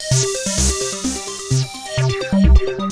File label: PHASER, DANCE; Pro-Rec - Synthsonic; Z1 DANCE